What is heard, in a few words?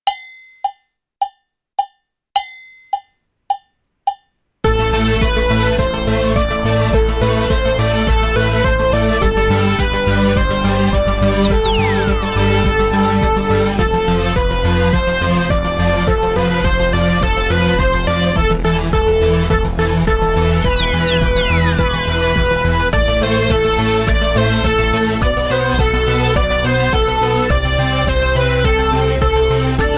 Techno Prisoners without click, Drum Kit; Drum Kit exam